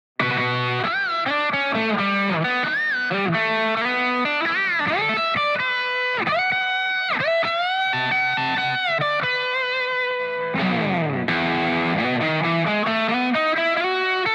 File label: booster Off